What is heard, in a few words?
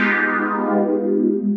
Wholetone, Funk Keys; Sonic Foundry (Sony Creative Software); Synchro Funk